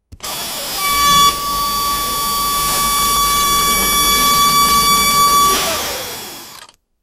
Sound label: mechanical rescue arm act, activation